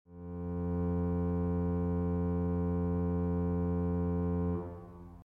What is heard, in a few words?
Reverb Tail Trombone